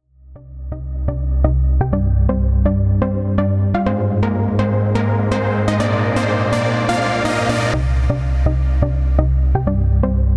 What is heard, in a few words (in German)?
Roland Gaia2 Sequenz